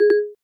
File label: Siri Stop Failure Haptic; i OS; iOS10; nano